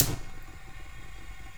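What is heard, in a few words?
IGNITION, household; AKAI Anime Sound FX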